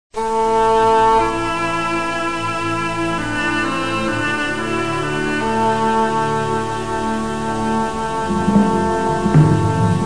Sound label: oboe